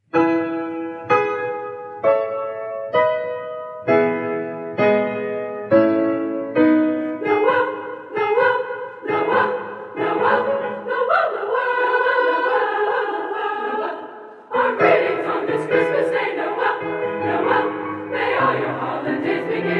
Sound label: Musical Christmas Card Women's Chorale; USC Women's Chorale -Pgh